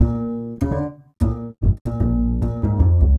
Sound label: Standup Bass, Drum N Bass; Sonic Foundry (Sony Creative Software); Knutrix Drum & Bass